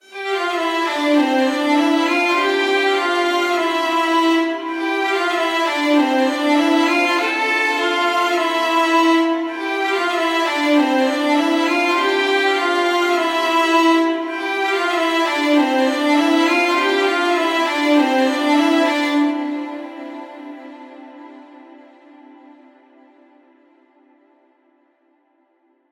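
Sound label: Violin & Viola Melody - A minor 100BPM; Construction Kits; STEMS; Ghosthack; SkyRealm; Kit 10 - Windhelm Forest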